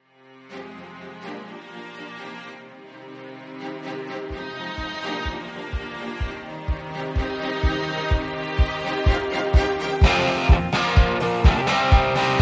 orchestral to indie

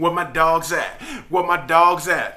Rap Vox

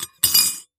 metal spoon place in empty bowl

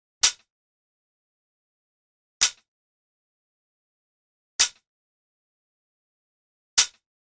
Ambient House Hat 110BPM

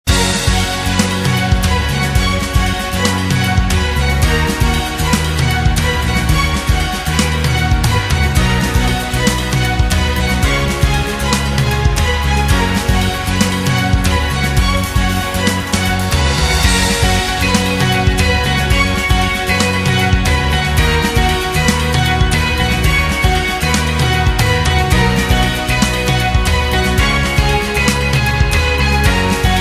Business Presentation loop

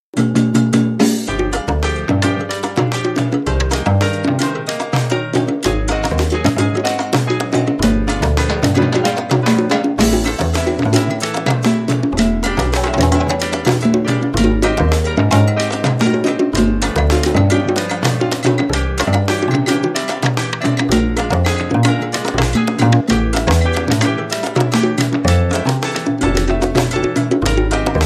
Conga Spice no horns

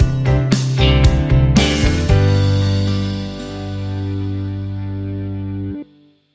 Love Power Stinger; bbc

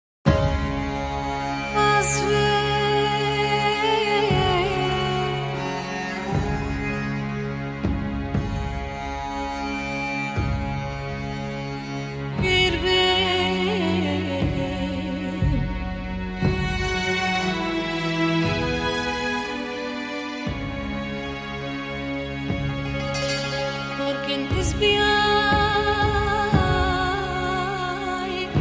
Ethnic Study

